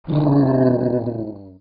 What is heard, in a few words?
Monster sad growl